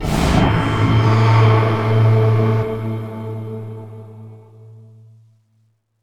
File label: Combo Sting